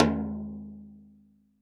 TD-20 Tom A, Roland TD-20 Toms

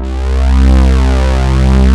OBX SYNTHS; Pro-Rec - Synthsonic; JV ANALOG1

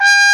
CORNET G5; AKAI S-Series CD-ROM Sound Library VOL-8

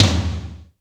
TOM LO; Back In Time Records; Big Bang; THE ROOM

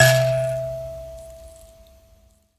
PLK Grand Mbira

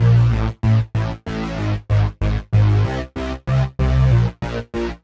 Slip Bass 95-A, horrorscape samples 95bpm

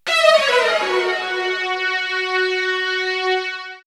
String Run, string section; X-Static Goldmine; backtothe70's

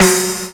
INS TIMBAL

